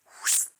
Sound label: Zoom In